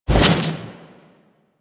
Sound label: Melee Jump Slam Short Charge, Whooshes; Weapons; Warframe